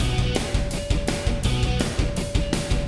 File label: Boss Fight Phase 2 MIXED; ben 10; Weatherhead Prime